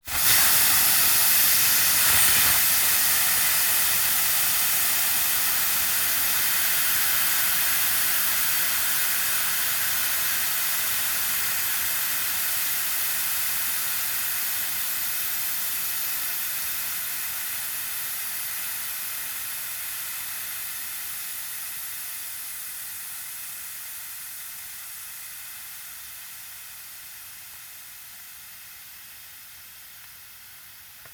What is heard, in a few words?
Bicycles, Valve, Air Release